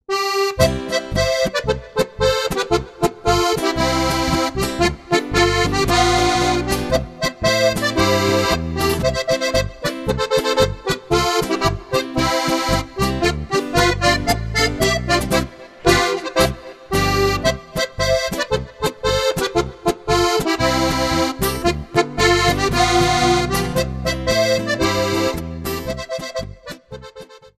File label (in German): polka